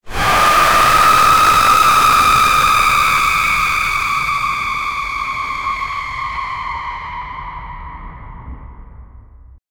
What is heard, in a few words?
terrifying horror jumpscare